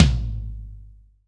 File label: ambient tom a sharp key; tom drum